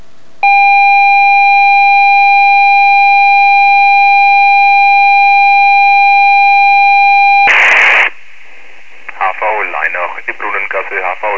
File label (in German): HVO Alarmierung